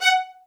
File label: MED ATT F# 4, soft violins; Q Up Arts; Denny Jaeger Master Violin Library